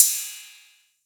Royality free cymbal drum sample tuned to the D note